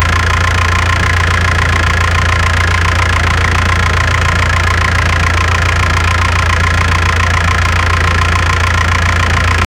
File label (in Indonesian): suara engine; engines